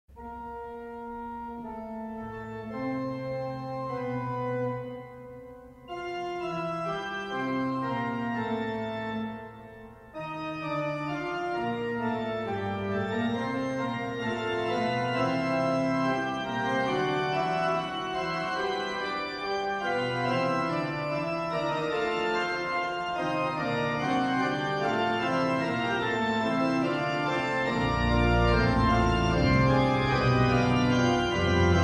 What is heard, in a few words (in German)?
Improvisation ueber B-A-C-H